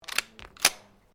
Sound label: Pistol Tanfoglio 38 super cocking; Handguns, non military; Pistols